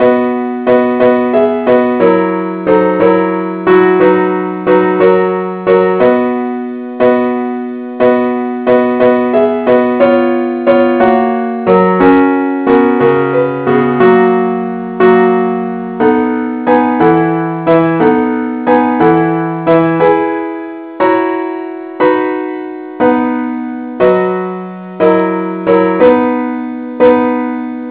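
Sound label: parts only keyboard; angel voices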